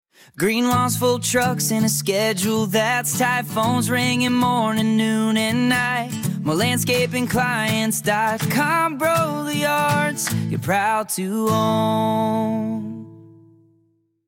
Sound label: jingle